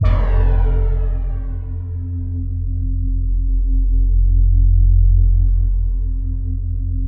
PAD

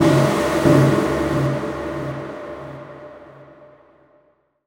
TECHNO FX 140

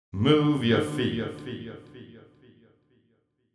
deep house male, 125, A, vocals

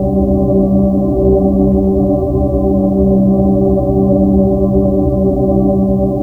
AMBIENT 1 LOOP; KillerTweaksII; DirectWave

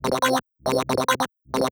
TECHNO EXTRA 140

